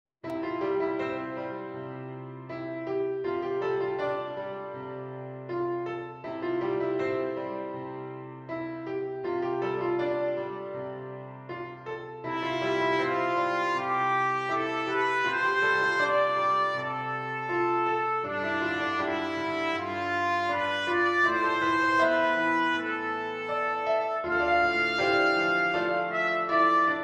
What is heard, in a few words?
Beautiful Reflection trumpet; flugel or cornet